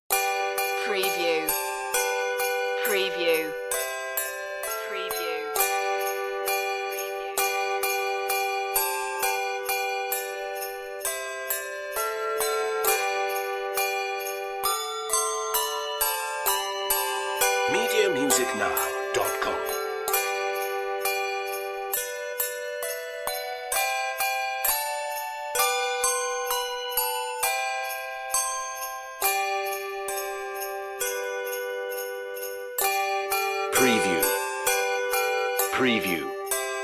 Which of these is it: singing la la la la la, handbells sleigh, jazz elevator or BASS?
handbells sleigh